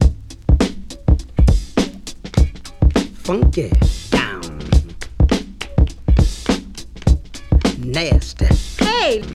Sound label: 103 bpm high quality drum loop sample c sharp key, Free breakbeat sample - kick tuned to t